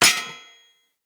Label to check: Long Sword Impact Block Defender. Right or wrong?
Right